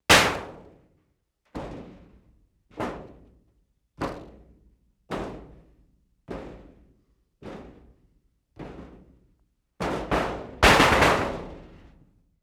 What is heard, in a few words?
metal noise